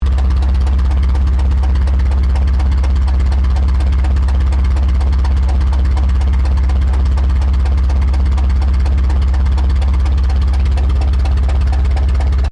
FORKLIFT DEISEL LARGE IDLE LOOP, Diesel powered forklift truck; Construction Vehicles